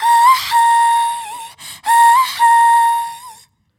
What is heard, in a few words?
vocal phrase dry pitched up "ah ha" 128 Fm